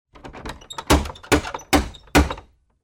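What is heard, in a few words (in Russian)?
четыре быстрых удара старым нокером; Звук стука в дверь; Для детей, для детских постановок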